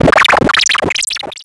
Transition Digital Scanner; Production Element Title Transition

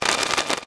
Multimedia Internet CD-Rom Flash Click Multiple

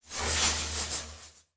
Flash Wipe Electronic; Multimedia Internet CD-Rom Flash

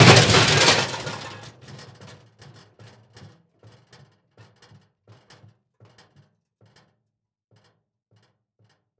Impact Metal Object Hit And Starts Rocking Cement

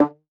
Household Rubber Band Twang

